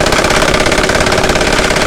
gau21 fire, cannon; wac

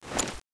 scar draw; cso; scar oz

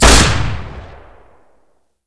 44 revolver, pistol 44 magnum; weapons

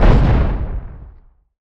step, bear

